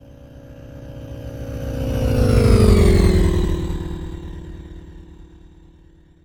Vehicle Fly By Left 2 Right Long 200 MPH, Sci-fi Flyby; David Dumais Audio